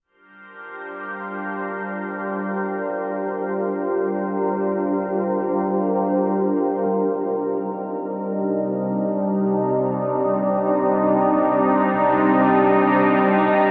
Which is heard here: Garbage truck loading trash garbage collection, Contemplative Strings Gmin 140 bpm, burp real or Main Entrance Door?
Contemplative Strings Gmin 140 bpm